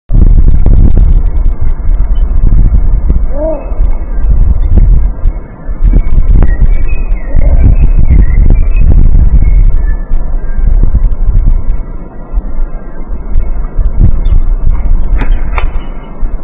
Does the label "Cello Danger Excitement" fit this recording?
No